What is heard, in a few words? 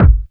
BD, 145 BPM; Ueberschall; Drum & Bass Resonance